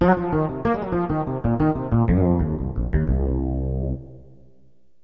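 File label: synth bass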